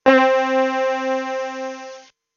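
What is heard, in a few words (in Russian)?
ситар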